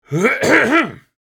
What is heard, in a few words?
Old man clearing throat